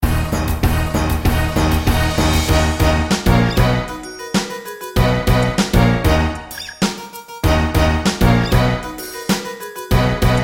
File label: Sony Ericsson; Nyc